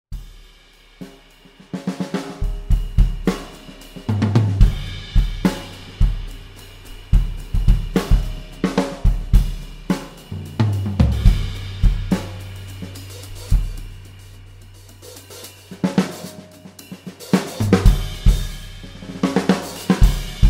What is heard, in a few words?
big beat groove